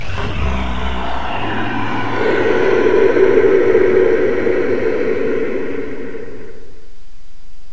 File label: white tree die; svencoop; source of life